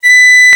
Piccolo mf B4; Digital Sound Factory Virtuoso

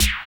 DRM OLD, dance synth

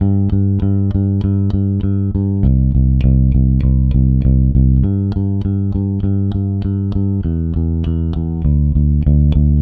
Rock, Bass; Bass X

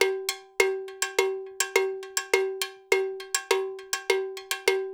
LOOP, Percussion; InVision Interactive; Percussion Slam; SULTAN

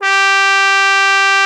CUP MUTE G4; Xsample VOL-3

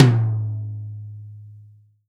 TOM, Real Drum Kit, FUNK KIT

